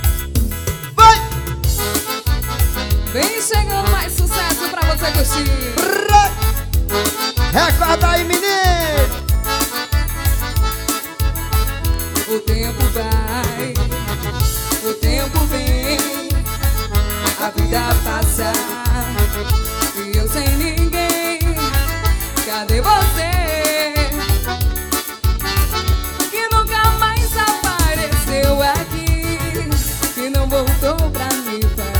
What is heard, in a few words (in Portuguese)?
acordeon